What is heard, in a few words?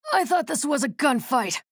pain ability damage; nyx; low health